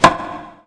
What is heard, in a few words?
shot; 0.08 seconds; VirtuaTennis